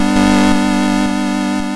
YM Chord Bm; ChipShop Deluxe; ChipShop Hits

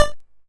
YM Perc, DRUMS; ChipShop Deluxe; ChipShop Hits

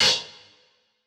Tech Drum Hit, techno 120bpm

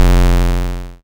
YM Bass F; ChipShop Deluxe; ChipShop Hits

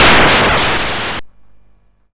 Snare, YM, CHIPSHOP HITS DRUMS; ChipShop Deluxe

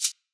DnB Shaker; drumnbass 170bpm; Drum Kits; musicradar; Drum Hits A

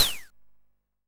Ym Multi Tom C5; multi-samples; CHIPSHOP DELUXE

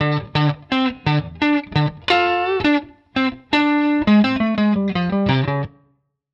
Guitar Line, hiphop; 85bpm; musicradar

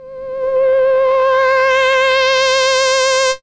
SID lead C; ChipShop Deluxe; ChipShop Hits

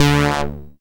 SID Synth D; SYNTHS; CHIPSHOP DELUXE; CHIPSHOP HITS

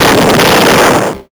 FX Explo; SFX; YM; ChipShop Deluxe; ChipShop Hits

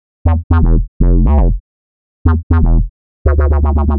Tech Bass 120 E, techno; musicradar; 120bpm; Bassline

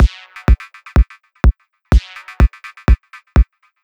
Beat 125BPM (Synths Kick), techno; techno drum fx

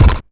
YM Kick, DRUMS; KICKS; ChipShop Deluxe; ChipShop Hits

